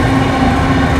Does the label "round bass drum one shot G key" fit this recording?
No